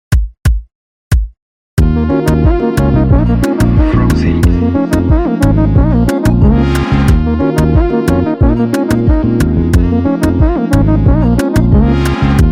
Afro Jazz

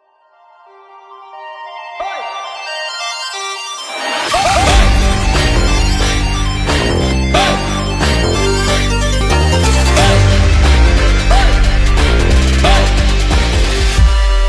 sped up phonk

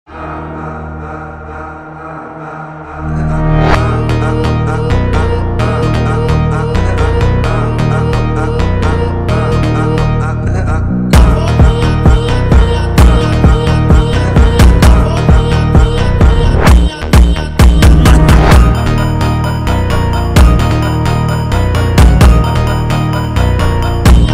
slowed tiktok remix

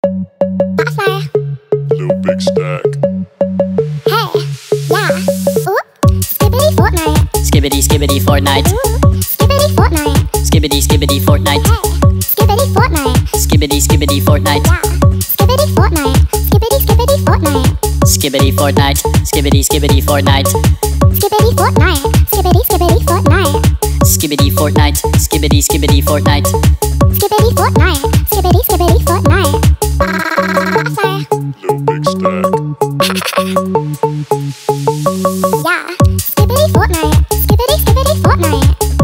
Sped Up Female Version

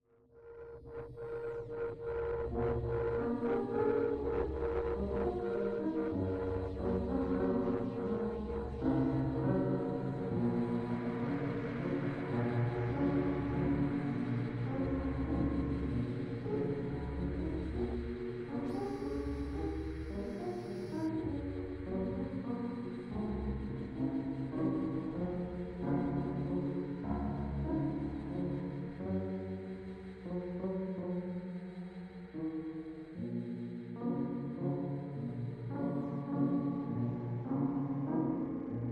Birthday Piano Theme Out of Tune

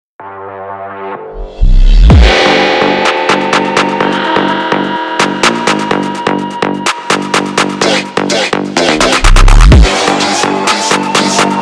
BRAZILIAN Phonk

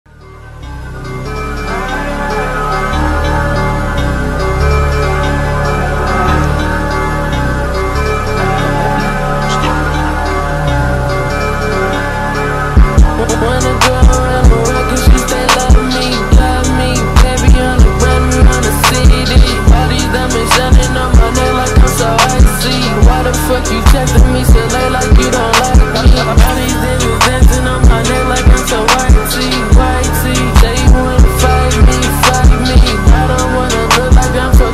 slowed

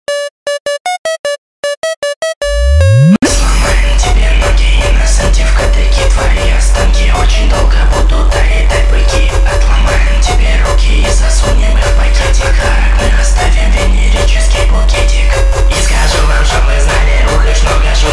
Speed up

